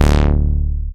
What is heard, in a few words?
Bass Shot D